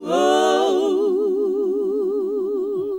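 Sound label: Gospel; Best Service Gigapack 1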